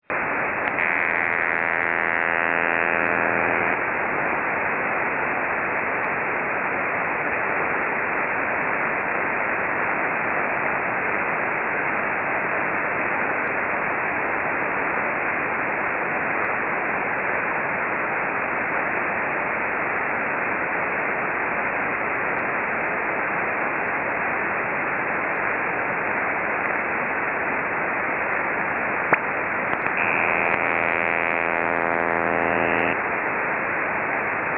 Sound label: Ionospheric Sounder or OTHR Radar